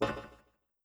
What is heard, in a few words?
Footstep; deltrarune yellow